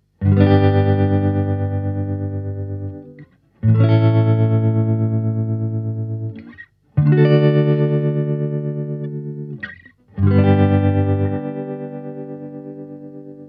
tele channel 1 tremolo, fender super champ x

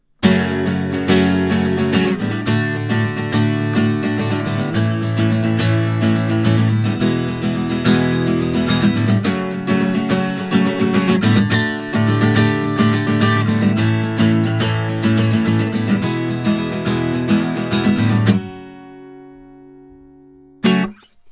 strum; m-audio fast track ultra

